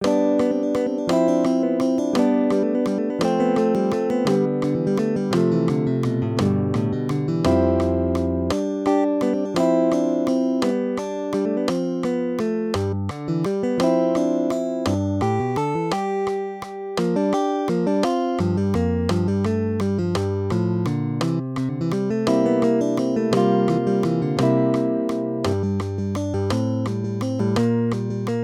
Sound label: duet, guitar; 3/4